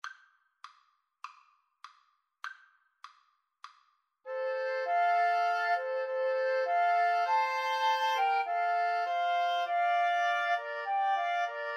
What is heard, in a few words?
Woodwind Trio; fl, ob, cl; Fischer, William G - I Love to Tell the Story